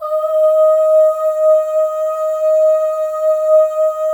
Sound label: OH, FEM, Symphony of Voices; Spectrasonics